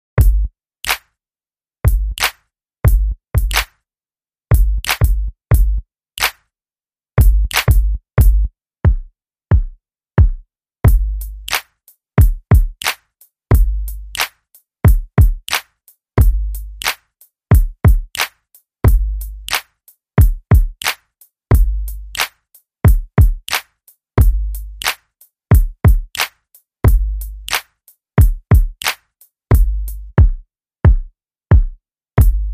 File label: Beat